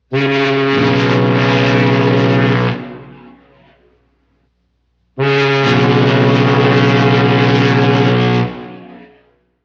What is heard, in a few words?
Fog Horn Two Tone Twice